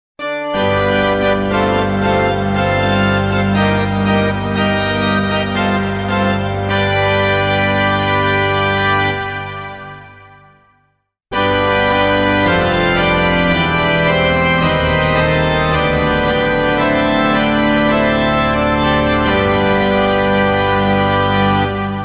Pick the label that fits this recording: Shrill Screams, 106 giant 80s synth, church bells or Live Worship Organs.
Live Worship Organs